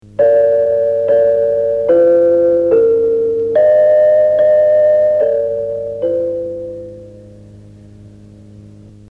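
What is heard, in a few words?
Radio Berlin International Interval Signal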